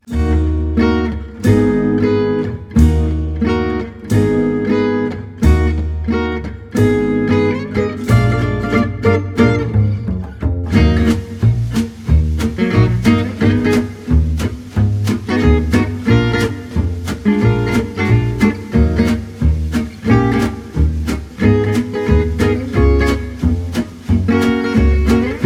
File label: holdmusic; catacombs